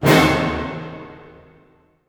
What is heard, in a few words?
D# DIM FF, orchestra hits; Sound On Sound - Peter Siedleczek's Orchestra